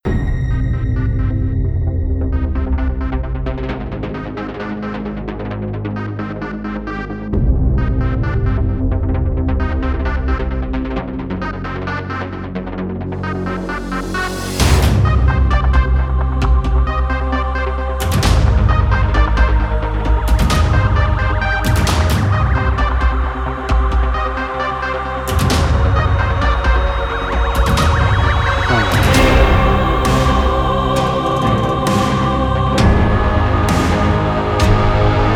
Loop Electric Deathmatch; Starboost EX

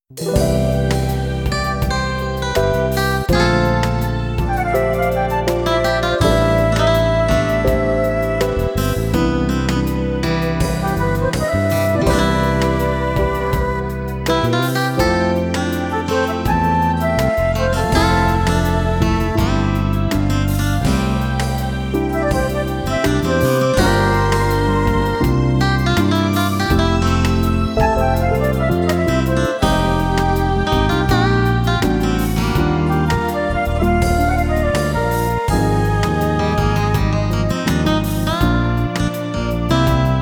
Calm; D.A: White

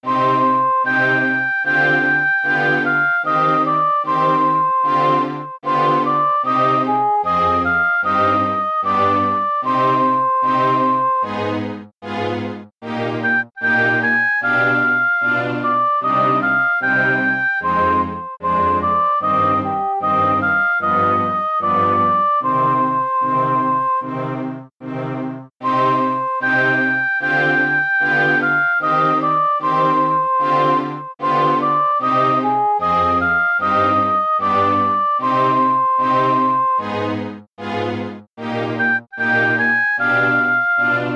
Movie BGM Sad

